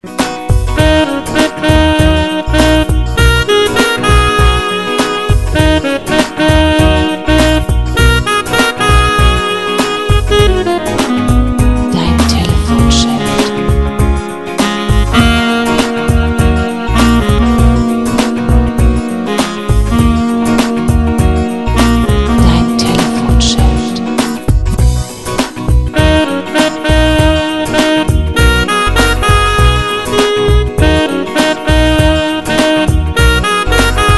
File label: Saxo Funky Telefon Ring